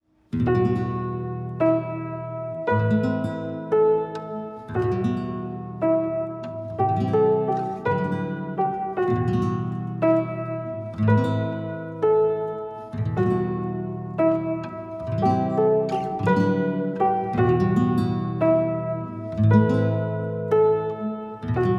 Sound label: Lonesome Piano Guitar Theme